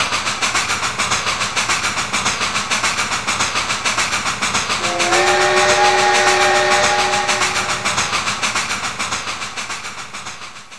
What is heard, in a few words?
train